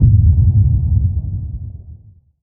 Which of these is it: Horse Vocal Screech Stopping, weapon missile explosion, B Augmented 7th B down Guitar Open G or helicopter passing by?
weapon missile explosion